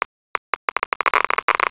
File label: click